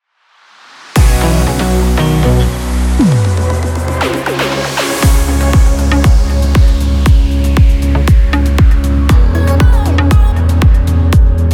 Traffic; Radio Hamburg

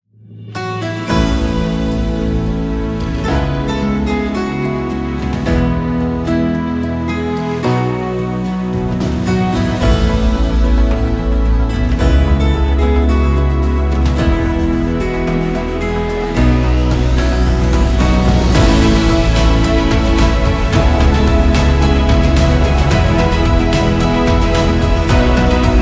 World Youth Day Theme; Radio Horeb

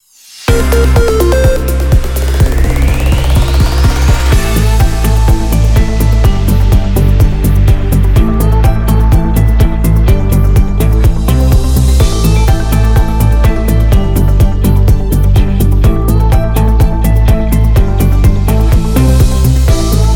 Jam

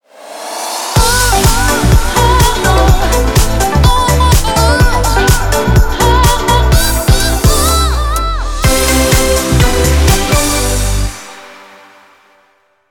Short Instrumental

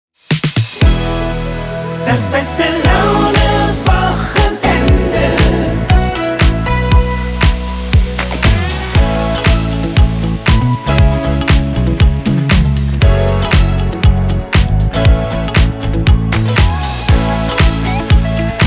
Weekend Theme; Hamburg 2

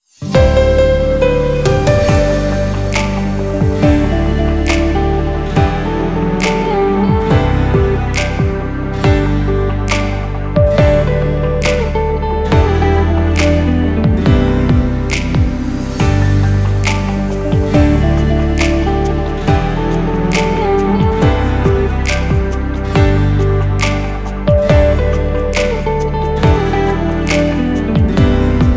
Rainy

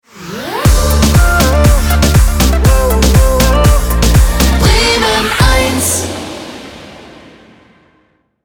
Jingle Hot; Bremen Eins

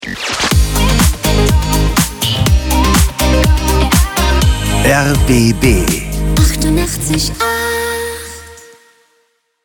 Transition Short

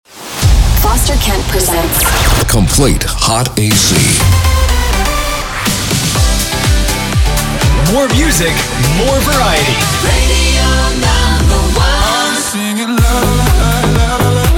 Complete Hot AC; jinglepacks

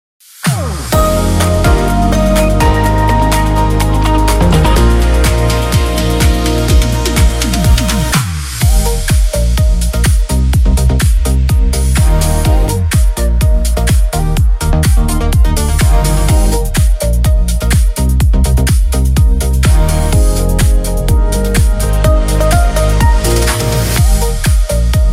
Opener

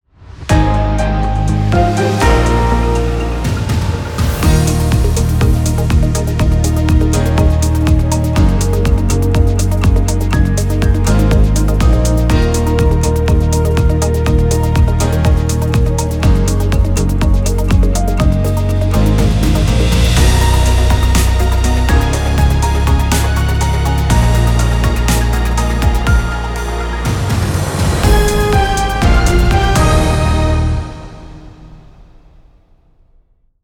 Infotainment; Radio Oberoesterreich

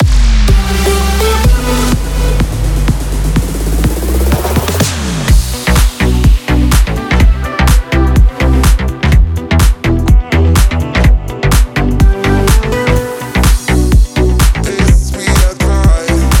Daytime Opener; SWR3

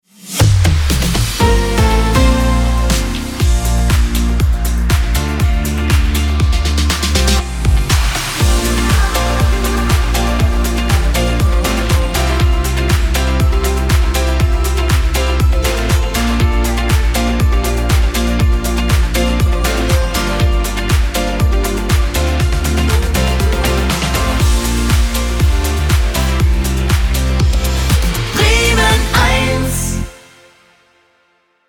Morning Opener; Bremen Eins

